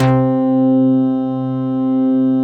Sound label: SMACK; Masterbits - Tekkno Therapy